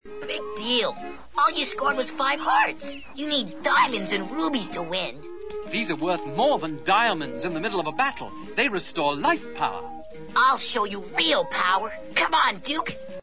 ugh

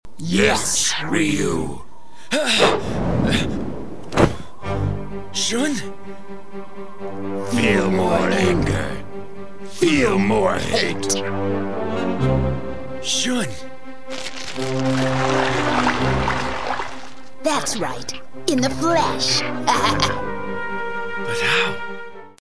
groan; sfalpha

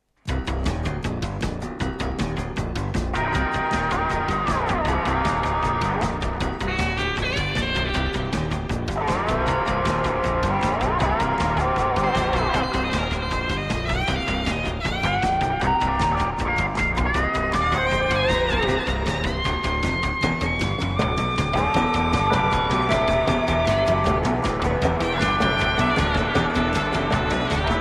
background

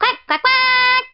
quack; n64

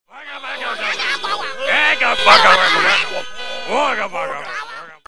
funk

